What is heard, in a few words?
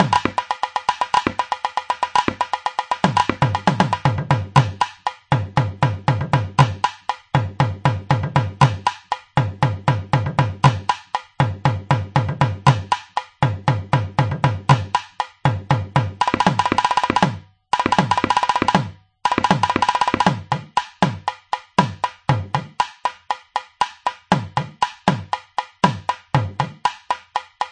tavil, groove